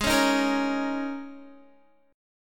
G sharp Suspended 2nd Suspended 4th G sharp Guitar; strummed; 16,16,13,15,13,x; 4E,4A,5D,5G,5C,6F; G#sus2sus4